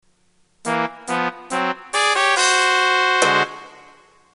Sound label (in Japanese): quiz hazure